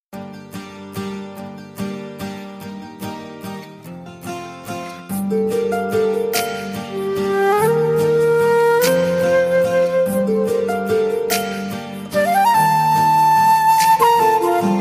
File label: Sad Romantic Tone